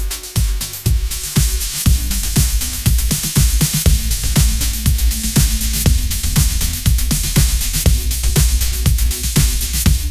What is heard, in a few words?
shining lights pop anthem, ringtones; ai-generated